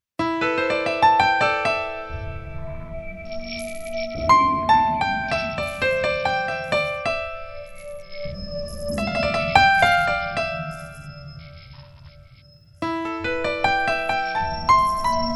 piano

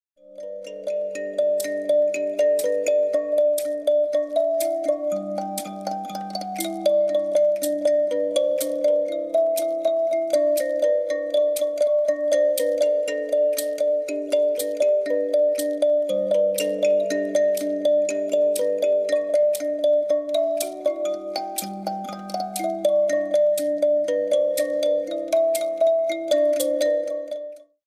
mbira

